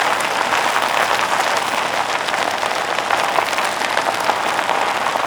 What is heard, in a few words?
clown trike dirt loop; Ringling Bros. and Barnum & Bailey; Wii